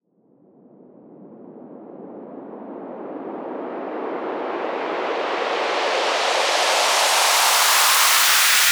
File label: White Noise Riser 110 BPM; Cymatics; FX Essentials